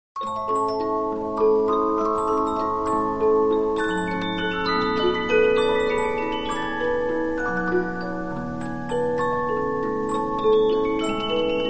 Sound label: Polyphon, 15inch disc musicbox